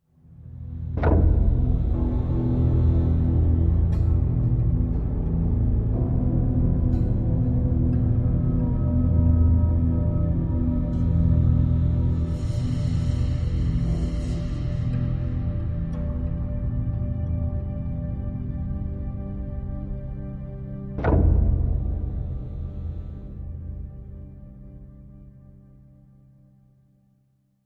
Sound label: Loop Spooky; tales from the borderlands gamerip 2015